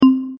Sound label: bright marimba short; phet io wrapper sonification